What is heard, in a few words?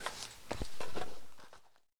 generic cloth slow, weapons handling